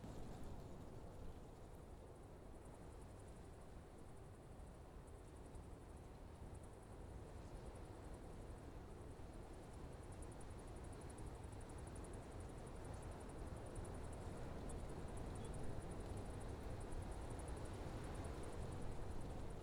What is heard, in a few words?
wind loop; environment; OpenValley